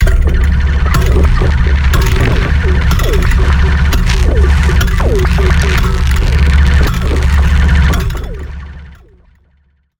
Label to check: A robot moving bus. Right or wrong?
Right